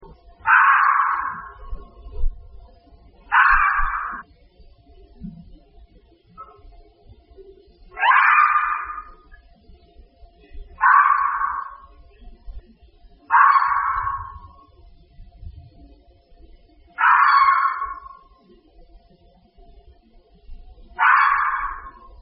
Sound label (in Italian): volpe